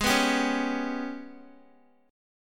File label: Ab Minor Major 7th Double Flat 5th, Guitar; strummed; 4F,4A,5C,5G,5C,6E; x,11,11,12,13,x; JGuitar